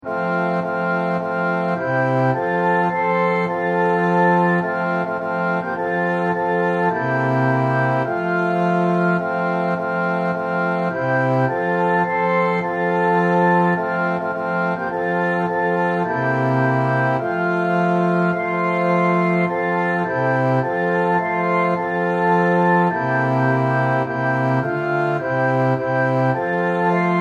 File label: Orchestra